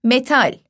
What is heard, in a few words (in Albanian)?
metal